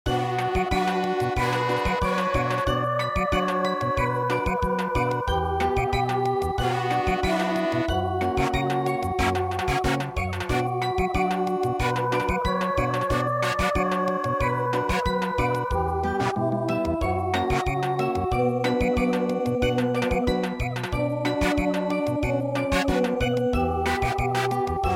Samba; Ruined Castle Moon

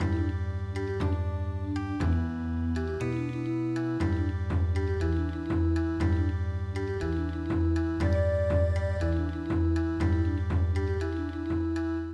Bon Odori; Hataha Hataha

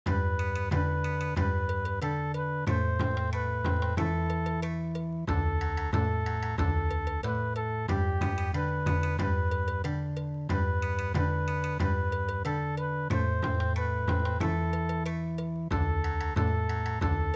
Bon Odori, traditional

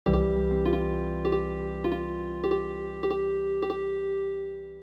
Healing Harp

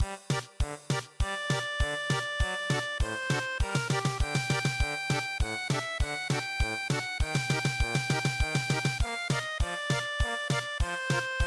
German Polka

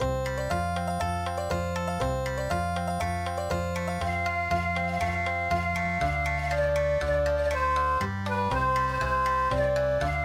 Okinawa

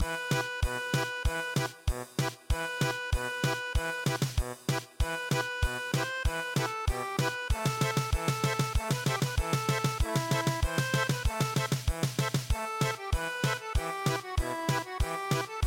German Polka

